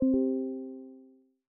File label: Kbd Pen Charging; Microsoft; Windows 10x